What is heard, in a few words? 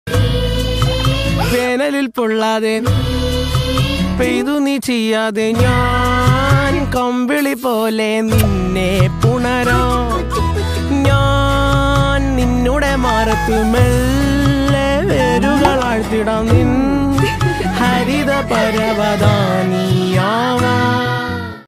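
Malayalam ringtone, background music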